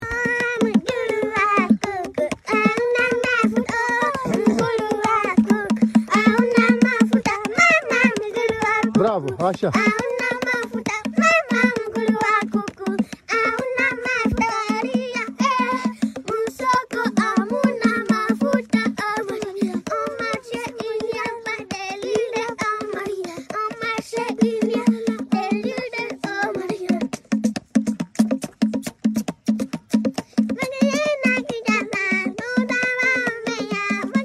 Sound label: born with rhythm future gen african stars use bottles voices music talented